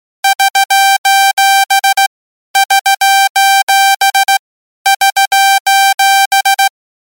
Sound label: SOS Sound, ringtones